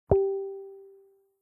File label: Slider Click Sound; Microsoft.ZuneVideo; ImmersiveControl